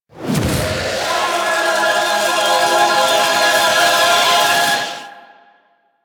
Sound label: Death Rise; Warframe; Kuva Larvling